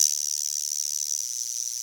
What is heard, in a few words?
Guiro Long C78; Drum Machines; Drum Hits; Wood